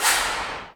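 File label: Torque Wrench, Drum Machines Alesis D4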